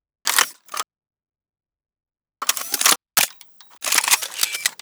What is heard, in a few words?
reload, weapons; bianachi